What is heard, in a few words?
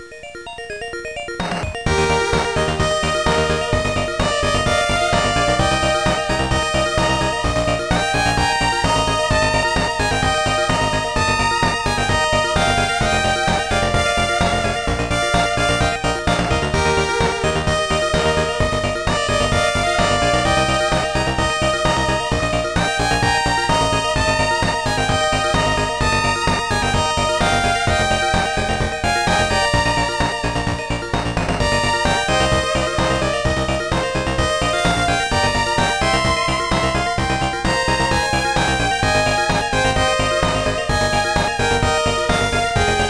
pce swim; Tokimemo